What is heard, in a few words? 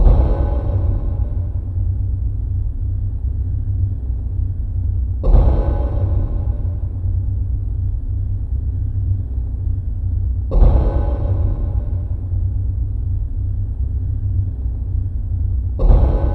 Apartment Building Stairwell; Krane